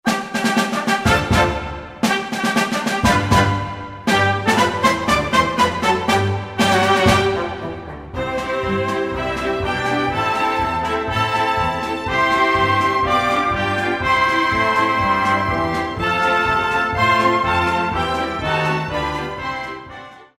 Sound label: Patriotic Anchors Away